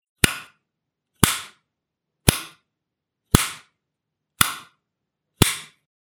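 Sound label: switch; zvuk vykljuchatelja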